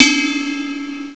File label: RIDE BELL; CYMBALS; CMI Sound Library Rev 1.3